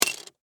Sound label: Legos Drop Box, Single, Piece, Plastic, Toys; Foley; BlastWave